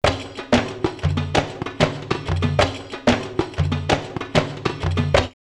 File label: Arabian 94, drumloops World Ethnic; X-Static Goldmine